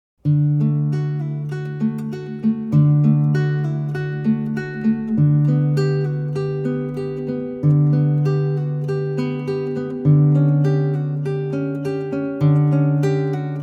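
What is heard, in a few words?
Prelude in D, acoustic guitar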